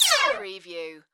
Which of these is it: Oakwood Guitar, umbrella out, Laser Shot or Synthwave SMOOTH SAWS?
Laser Shot